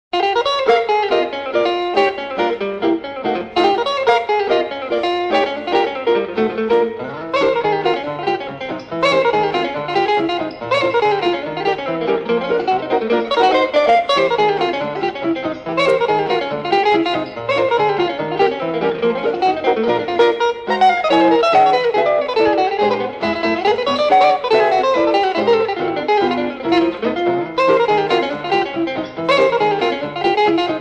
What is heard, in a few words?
Jazz in G